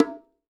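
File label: LO BONGO muffled; Sonic Reality; Interactive Drum Kits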